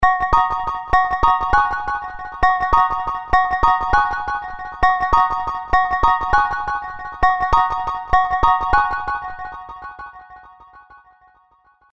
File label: Delight Ringtone, Digi Delay; HTC